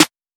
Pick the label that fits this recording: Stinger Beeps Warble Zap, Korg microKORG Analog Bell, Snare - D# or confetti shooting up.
Snare - D#